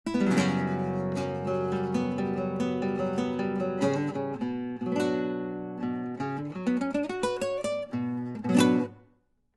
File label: Without Clip On Reverb